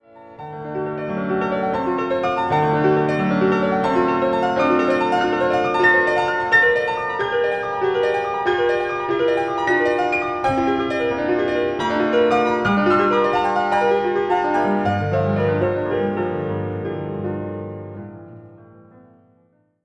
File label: Romantic Piano